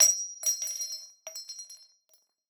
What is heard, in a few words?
casing 40mm; gmod; arccw uc commons attachments